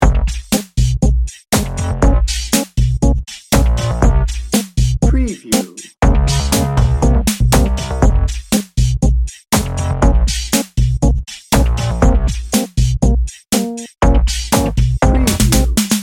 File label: tango passion loop